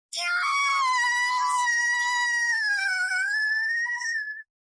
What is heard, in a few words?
screams of hell